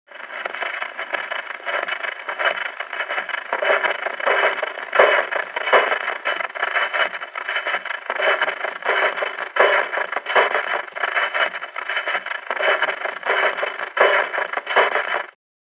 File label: Gramophone; Old Gramophone Needle Scratch, Winding And Turntable Revolving.; record players